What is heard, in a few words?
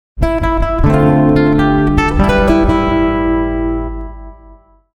tarantella